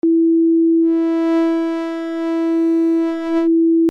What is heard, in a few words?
scan